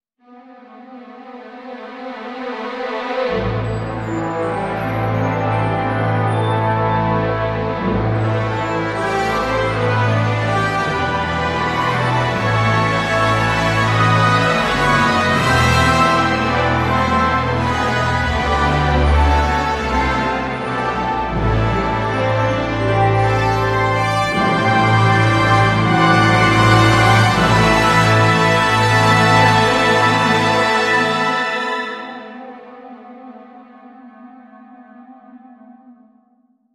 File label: Whirlwind Score